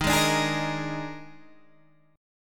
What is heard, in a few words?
D sharp Major 7th Suspended 4th, D sharp, down, Guitar, Standard; strummed; D#M7sus4; JGuitar